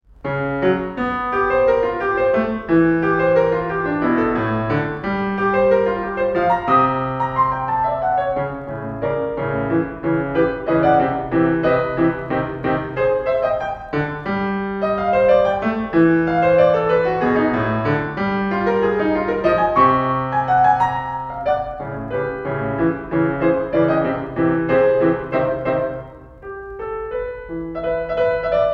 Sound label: square pegs piano